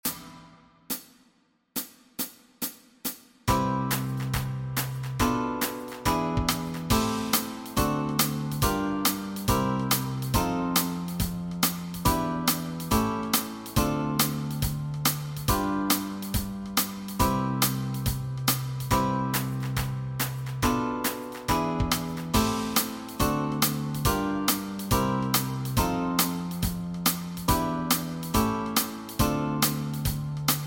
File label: Backing Track, walking bass